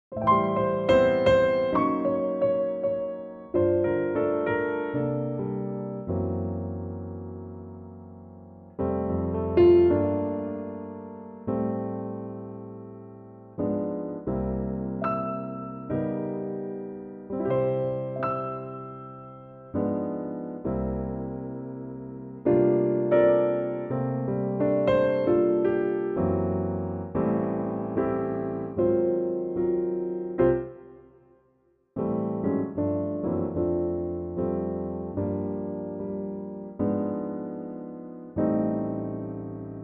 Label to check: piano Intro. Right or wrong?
Right